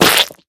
Gum Splat; Candy Crush Saga; Pepperbomb

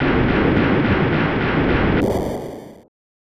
Fire

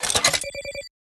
Sci-Fi Weapons-Loading Fx; Robobeat

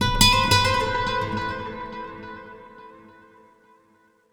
Oneshot Acoustic Guitar; zombie army 4 dead war ps4 stadia switch windows xbox one gamerip 2020

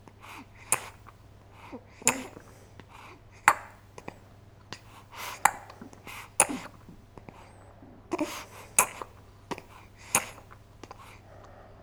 SFX baby drinks milk from bottle

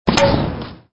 Tennis Impact; Extra Lives

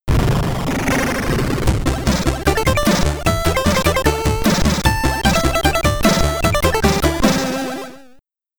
Stage Clear (SID Stereo), commodore 64

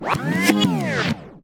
Rewind

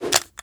Equip Ranged Weapon

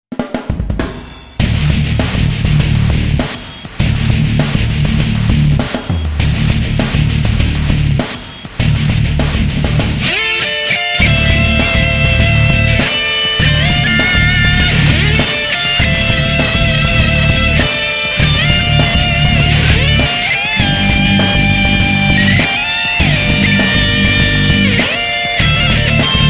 Sad Duel

